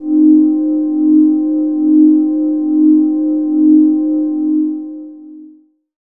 synth, Synth Atmos (pad); Chillout

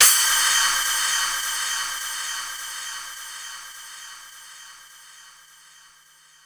Cym Splash, Korg 05R-W Korg Cymbals; 300 Drum Machines

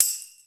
tambourine; Zero-G; Total Drum Bass; Drums Percussion

